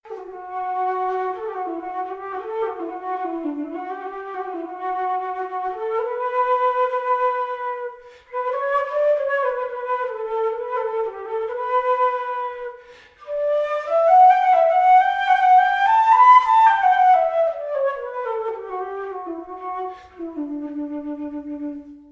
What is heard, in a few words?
Travers in Low D